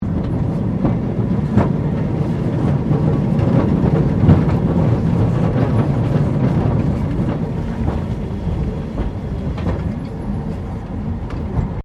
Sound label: Tram Cable Portland 1800s Points Crossing; Portland Cable Tramcar; Vehicles